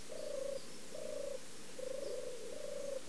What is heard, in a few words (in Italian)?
tortora, uccelli